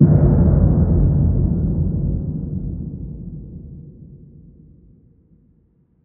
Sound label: Deep Impact; Psytrance; FX